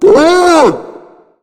warn; charger

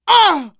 hurt critical

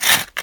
claw scrape

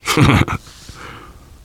laughter, survivor voice biker

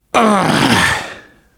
negative noise, survivor voice, biker

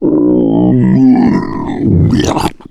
male boomer lurk; voice, idle

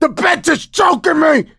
choke; survivor

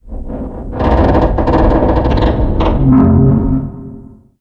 fire groan, ambient

